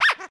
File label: Duck Push; Game rip; MiSide